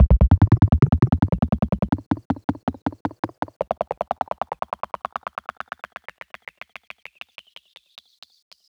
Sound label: Filter